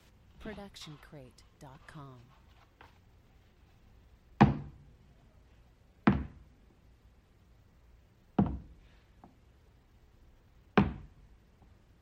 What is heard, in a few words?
footfall heels; soundscrate